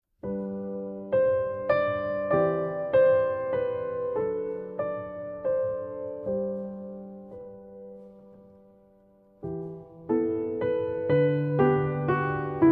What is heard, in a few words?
Spring Waltz